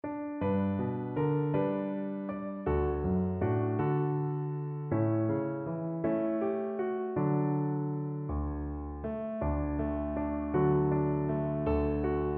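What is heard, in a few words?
VLC karaoke, Cello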